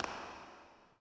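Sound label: echo run, footsteps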